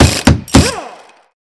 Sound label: build; block placed by survivor